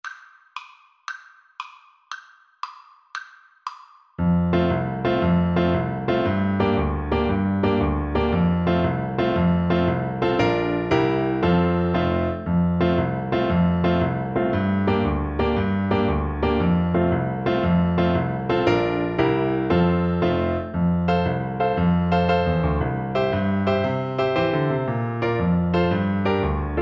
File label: irish washerwoman, Tuba